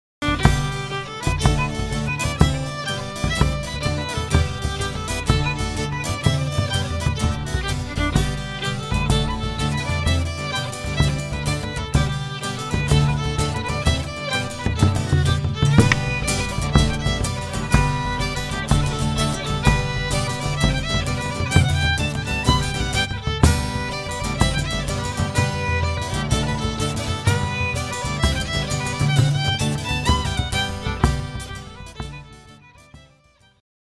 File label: Jig; Kesh Jig